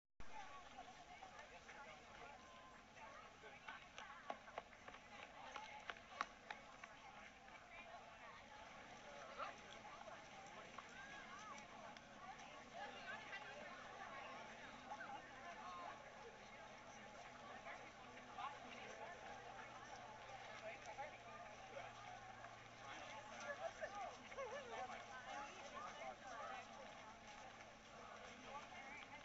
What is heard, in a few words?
Park Run Ambience